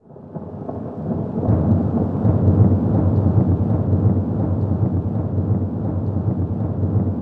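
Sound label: environmental, Drum N Bass; Sonic Foundry (Sony Creative Software); Knutrix Drum & Bass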